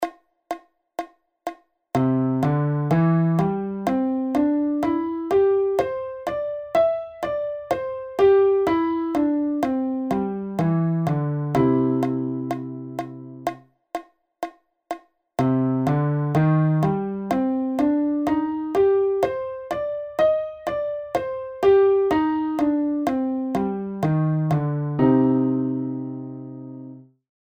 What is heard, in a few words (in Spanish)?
Arpegios add9